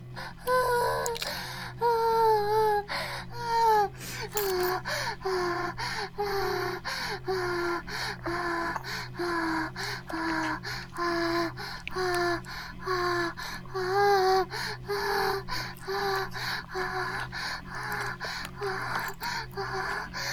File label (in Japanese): バイブ放置; イクたびにわけて